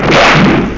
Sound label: flash; beach